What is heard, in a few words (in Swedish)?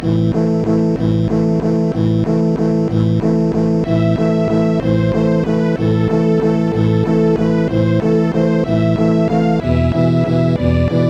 jazzsvit vals; MusicLine